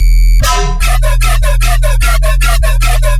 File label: bass loop 150 E; nonsensical; Virtual Riot